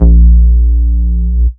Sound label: Root A#, Ultimate Bass; Vengeance Samples